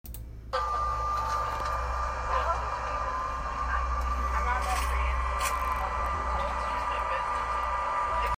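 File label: Air system take off two quick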